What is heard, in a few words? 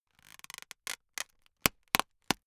plastic pressured highly slow